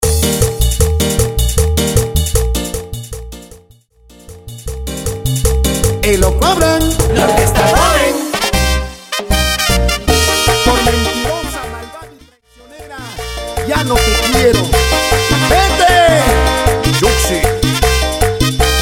Intro Simple 155Bpm

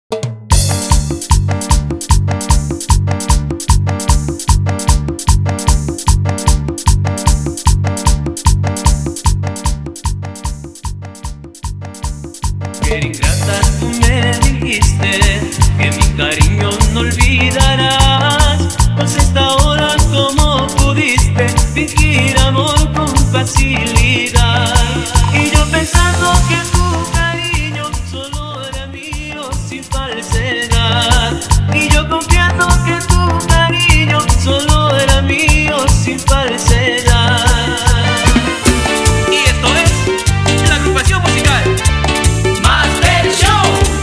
Intro Acapela Bpm 150

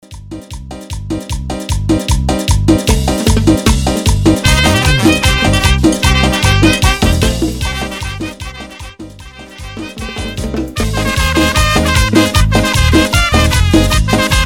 banda cambio de ritmo, Cambio de 152 a 129

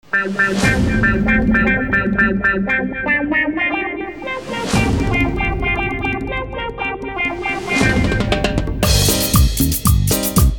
Instrumental Vercion Chicha 117 BPM

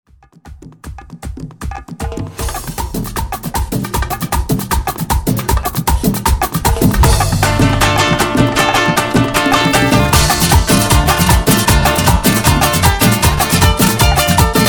Intro Banda R-Live, Bpm 155

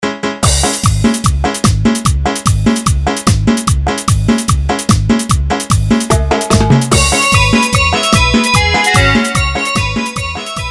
Instrumental BPM 148

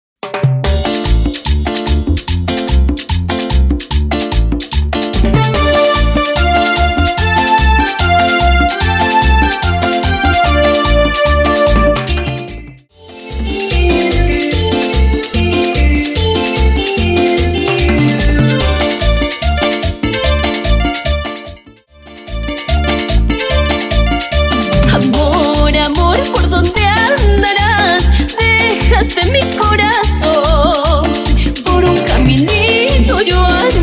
Intro Melody Acapela Animacion 147 Bpm

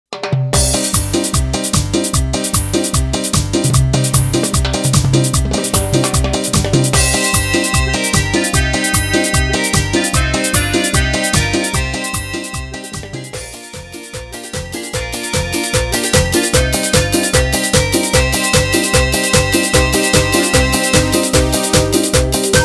Instrumental Chicha balada BPM 150

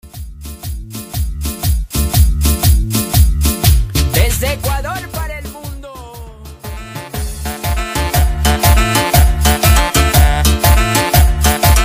Mix, Intro, 120 BPM

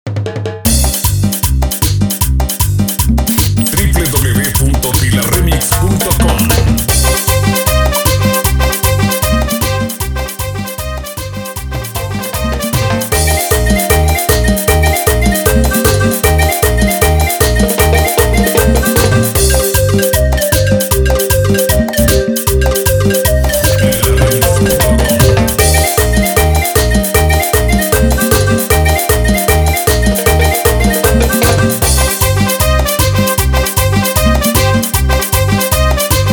Saltadito Instrumental

